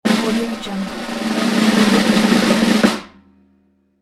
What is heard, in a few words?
drum roll until climax